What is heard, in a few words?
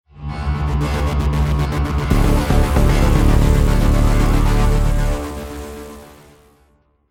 Lost Round; Counter-Strike 2